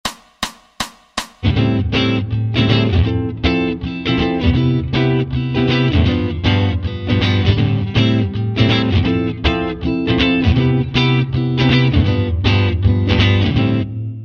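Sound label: Rhythm Guitar 7th Chords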